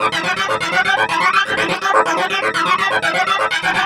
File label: Techno Effect Sect, Effected Horn Section; Sonic Foundry (Sony Creative Software); Jazz Solos and Sections